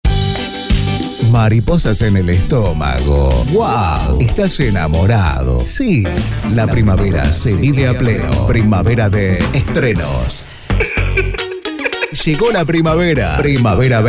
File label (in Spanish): Urbano